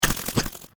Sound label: Sci Fi Fly Swatter; Science Fiction Sound Effects